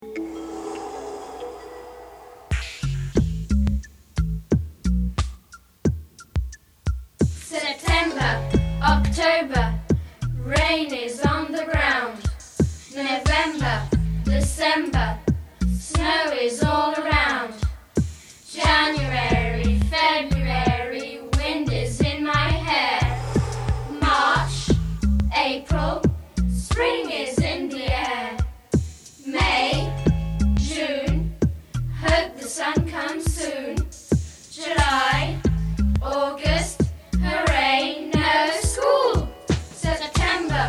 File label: WEATHER RAP